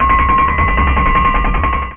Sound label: Industrial machine